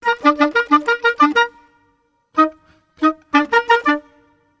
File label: 90 g min woodwinds; PowerFX - Downtown Orchestra; Orchestral arrangements